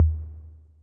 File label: snap, Yamaha Tenori-On Snapkit